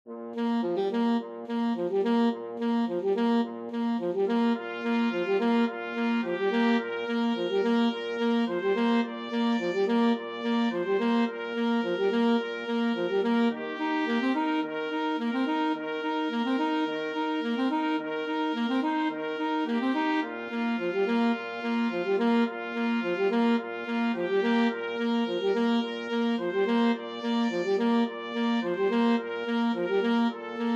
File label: flexible mixed ensemble, 3 players